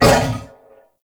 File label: Wolf Attack Short; Animals; Duviri